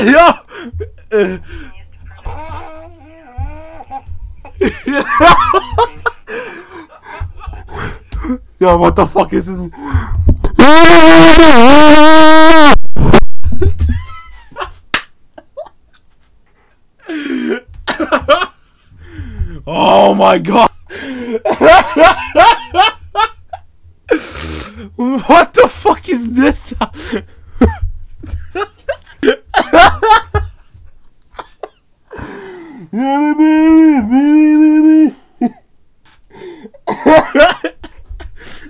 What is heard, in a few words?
me laughing; henry stickmin